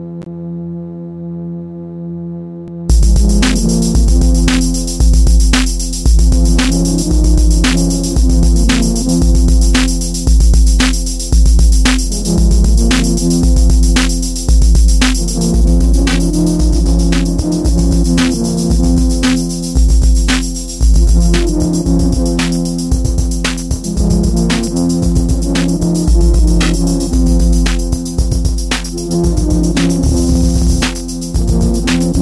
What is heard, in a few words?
Ds Organ